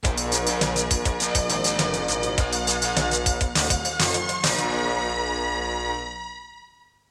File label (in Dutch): Filler kort zonder station call; jingles radio zoniën 1982-83